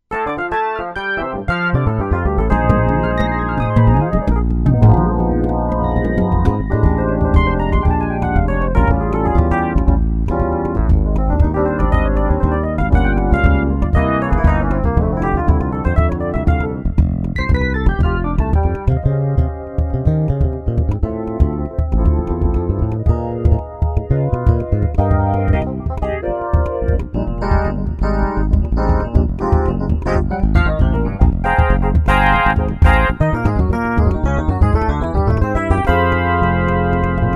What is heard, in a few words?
korg z1; factory demo 2